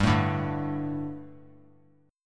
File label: G Suspended 2nd G, down; Gsus2 strummed; JGuitar